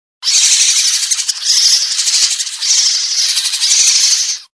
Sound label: Baby Raccoons